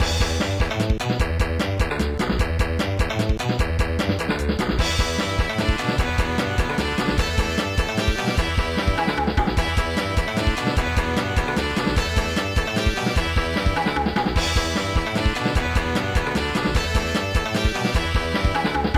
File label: Boss D Loop